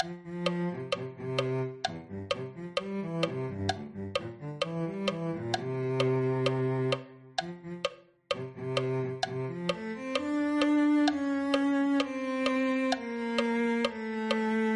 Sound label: cello